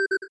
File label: alert, Retro Game Weapons Sound Effects